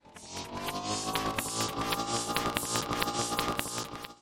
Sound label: Ship Teleporter Beam Player Body; Lethal Company